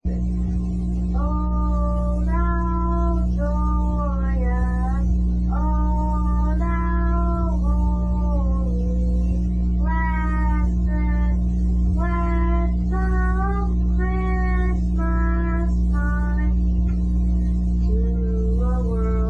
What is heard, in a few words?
singing oh thow joyous christmas eve